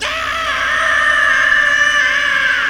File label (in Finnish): huuto; kummeli